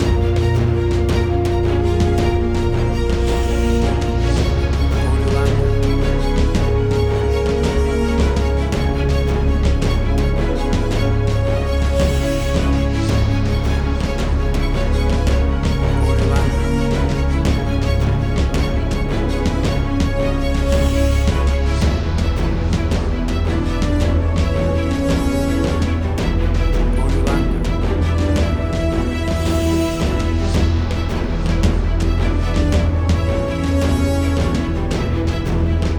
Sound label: Big Hollywood Action Movie Music